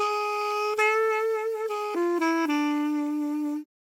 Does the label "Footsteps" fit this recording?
No